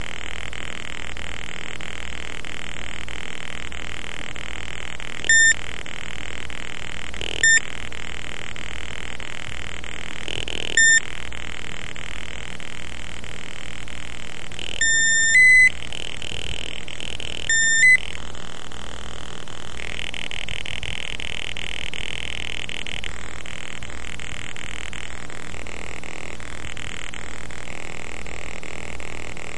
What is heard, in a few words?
circuitbent